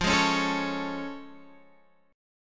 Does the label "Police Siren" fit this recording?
No